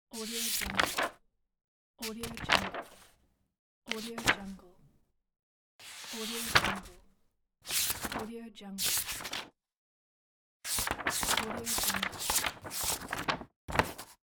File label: page turn; rm-sound